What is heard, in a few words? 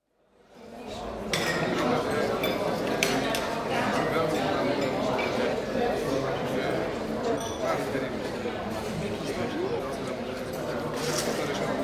Open-air restaurant, Bratislava; Restaurants (Sound Effects:Backgrounds); BBC